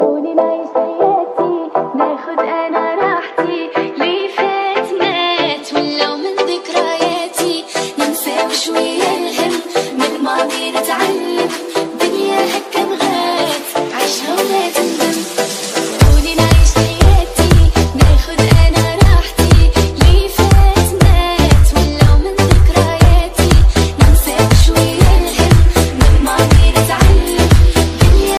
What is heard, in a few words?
ARABIC BASS BOOSTED RINGTONE